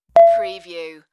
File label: Beep